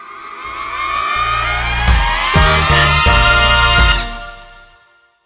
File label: Trumpetstar Ende